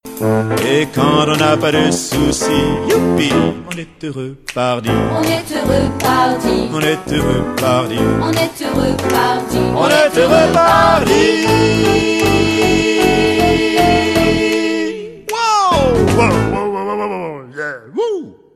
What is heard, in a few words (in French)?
Chanson